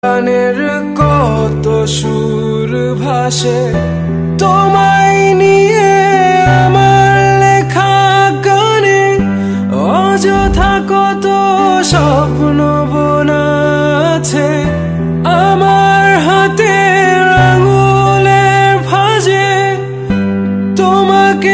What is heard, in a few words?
bengali romantic